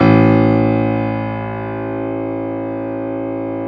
piano A0; AKAI S-Series CD-ROM Sound Library VOL-8

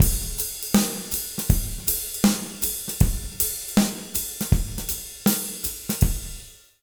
080 J ROCK, Jazz Latin Drumloops; USB Soundscan vol.08; AKAI

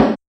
Kit Snare, Realistic Concertmate-670

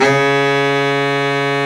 BARITON SAX

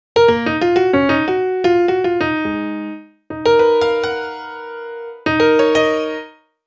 Piano Solo, Cinematic Funk; Sony Creative Software; Cinematic Funk Urban Tone Poems

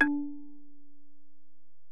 Bell, Alesis DM-PRO

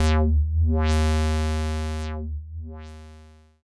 Acid Bass Eb, Grime, 135bpm; Zero-G Sound Sense Grime Scene; Construction Kits; A Positive Vibe

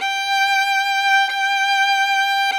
VLN G4; Sonic Reality; American Heartland

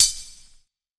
Lo-Fi Single Hi-Hat; DRUNK DOG

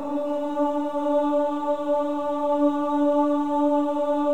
CHOIR AHS, AH D4; Spectrasonics; Symphony of Voices

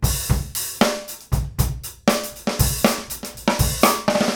groove, Grooves 99-132bpm

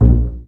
BA PIZZ, Orchestra; Best Service; Peter Siedlaczek's Advanced Orchestra

